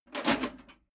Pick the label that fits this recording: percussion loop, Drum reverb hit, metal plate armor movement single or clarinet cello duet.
metal plate armor movement single